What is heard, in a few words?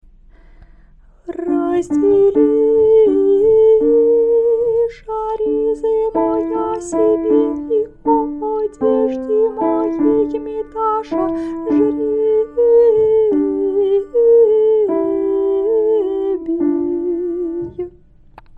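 great friday morning prokeimenon, soprano, alto, singing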